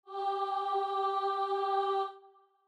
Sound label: starting note, voice; allegro moderato